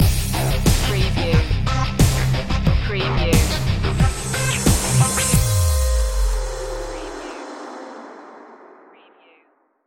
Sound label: slow jam rock stinger